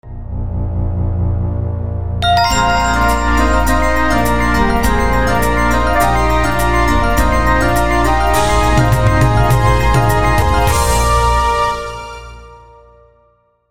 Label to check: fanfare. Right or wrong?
Right